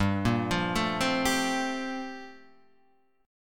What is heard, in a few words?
G Minor 11th down Guitar Standard; Gm11 arpeggiated; JGuitar